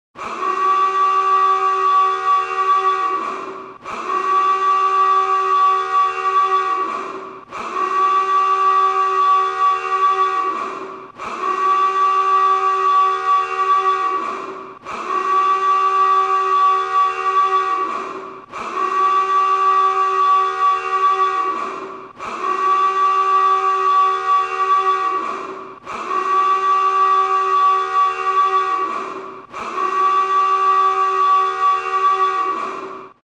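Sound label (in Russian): звук тревоги внутри лодки; Звуки подводной лодки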